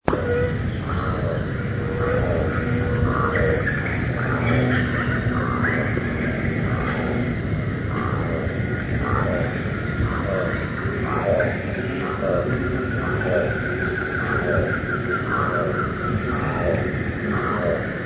canto da saripoca de bico castanho

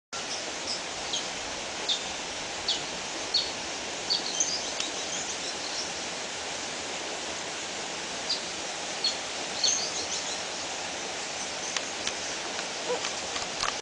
Canto do crested ant tanager

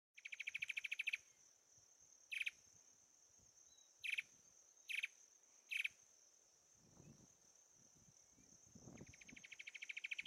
canto do papa formiga do sincora

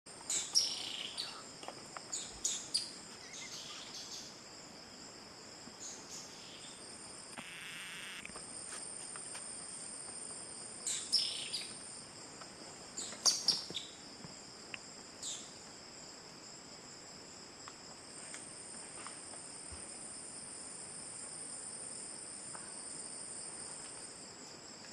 Canto do dancarino de cabeca amarela

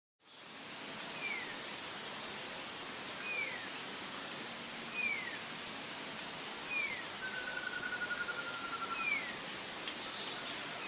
canto do limpa folha de sobre ruivo

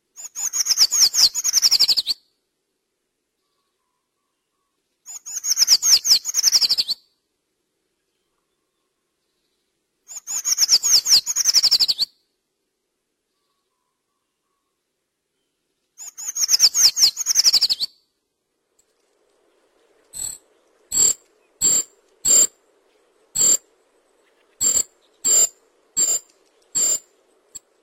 Canto da trepadeira marrom

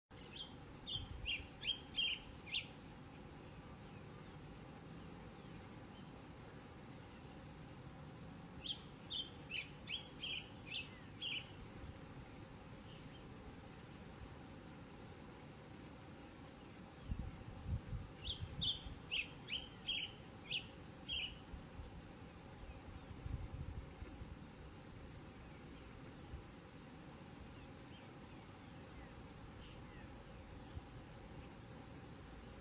canto do sanhaco de fogo

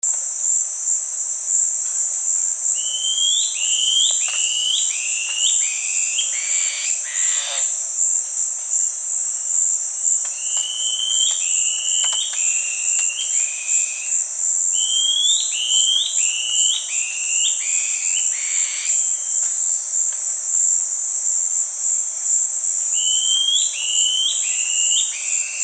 canto da mae de taoca de cauda barrada

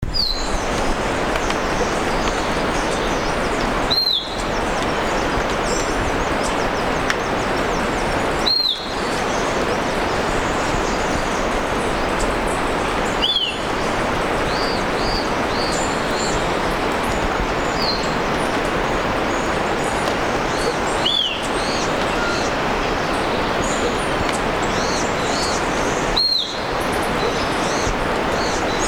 canto do gaturamo de bico grosso

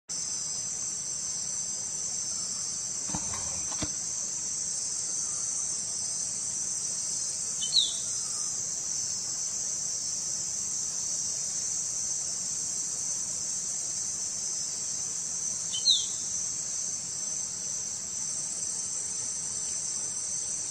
canto do tangara riscado

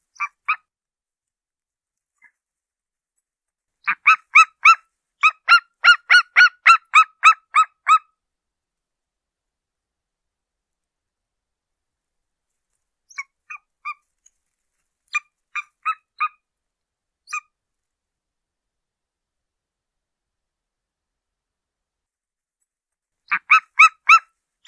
Canto da Águia Real

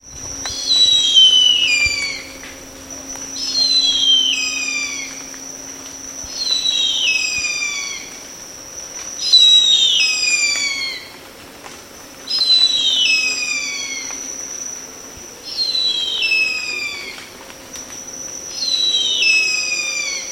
gavião

